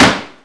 Target Hits; Playable Tutorial Level; TR3

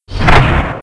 Door Close

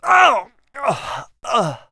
Normal Death; Tony; TR3; Playable Tutorial Level

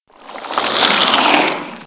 God Head Tentacles; Playable Tutorial Level

